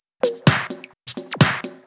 House loops 128BPM; Vengeance Samples

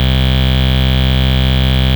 Ultimate Bass Root C; Vengeance Samples

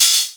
Cymbals OH, Open HH; Vengeance Samples

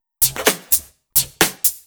Electro Loop 128BPM; Vengeance Samples

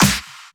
Pre-Shifted Claps; Vengeance Samples

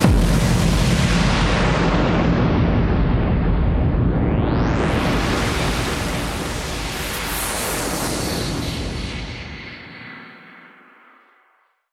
Hits & Explode

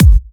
128BPM Kick; Vengeance Samples; VFH1 128BPM Technology Kit

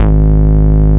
Bass, Root G#; Vengeance Samples

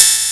Snare; Vengeance Samples

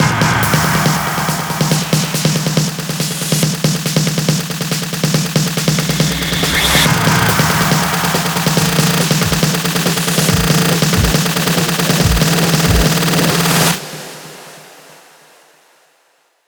140BPM 8Bars, HandsUp & Trance Fills; Chrome; Vengeance Samples

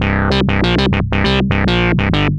ARP Odyssey, Arp A Line, synth samples

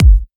Soft Kicks; Vengeance Samples

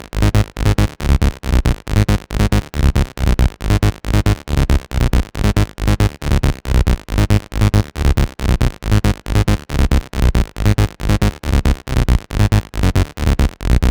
Bassline 138BPM, G# minor; Vengeance Samples; Manhattan Kit

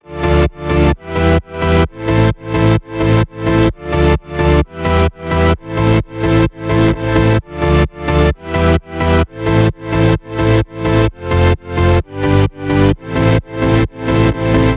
Root A 130 BPM; Vengeance Samples; VEH3 Special Sounds

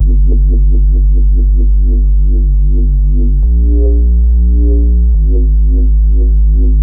Bassline; Ghosthack

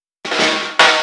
Fill 128 BPM; Vengeance Samples; VEH1 Loops

